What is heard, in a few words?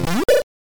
Gate Opens